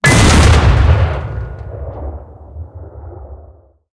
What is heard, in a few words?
cannon 122mm shot, cannons tanks